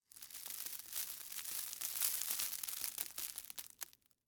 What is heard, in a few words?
Debris Ice Cream Cone, Soft; Texture; Materials; BlastWave